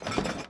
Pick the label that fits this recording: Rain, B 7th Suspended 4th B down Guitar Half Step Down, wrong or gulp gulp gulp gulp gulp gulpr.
wrong